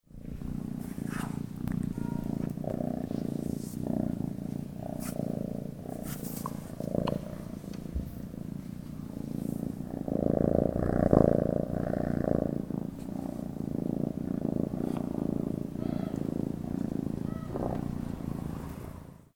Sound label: Cat purring sound effect